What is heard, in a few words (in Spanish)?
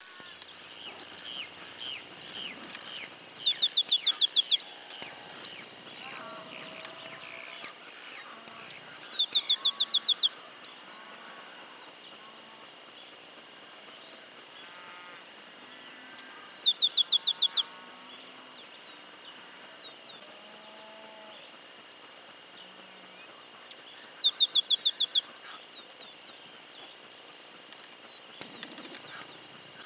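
cachilo chaqueño voz, aves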